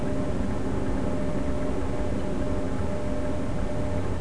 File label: AMBIENT; InventorLabs Technology; EDISON